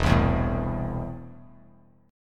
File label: E Suspended 4th Sharp 5th, Esus4#5 chord; strummed; JGuitar